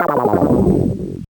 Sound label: Auction Fail